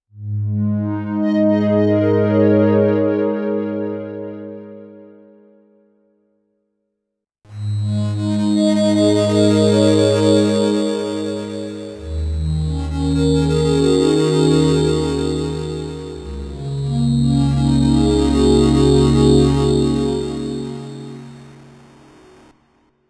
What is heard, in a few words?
Triangle Pad; Korg DCM8